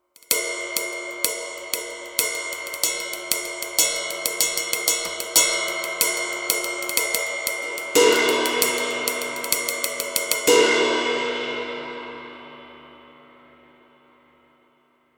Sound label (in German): Dynamik Ride